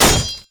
basic attack; LoL; tryndamere

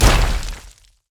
anchorchain miss; nautilus

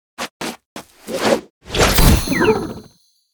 recall lead in; lulu skin04

